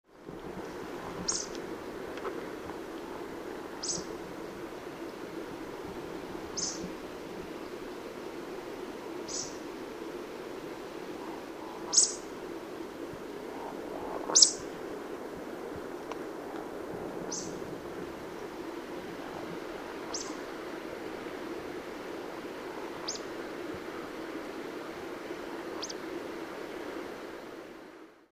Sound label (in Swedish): Morkulla